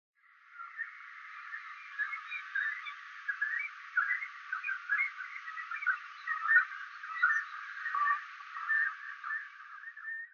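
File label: Japanese cormorant, muroran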